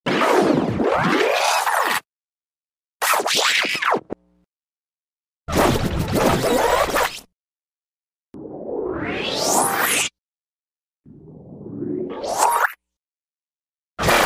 zvuk bystroj peremotki

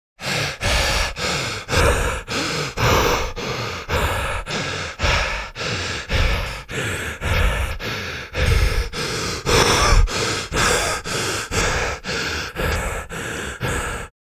dyhanie zapyhavshegosja cheloveka

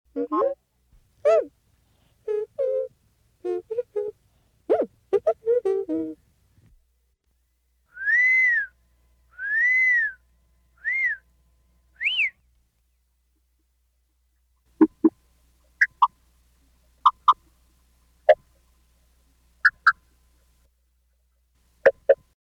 golos r2d2

